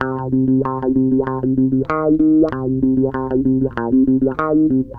WAH, WAH WAH GTR

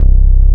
JV 101 BASS, JV-1080; Syntec; Wall of Sounds

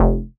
bass F1, ARP Odyssey

